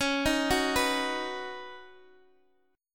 Db 7th Suspended 2nd Suspended 4th, Guitar, DADGAD; x,x,11,8,9,9; Db7sus2sus4 arpeggiated